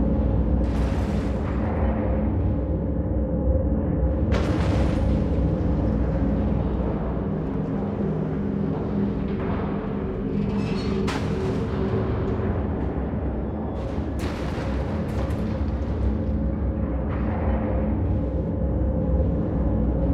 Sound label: fc ship preftl slaver broken idle; Stellaris; first contact; ships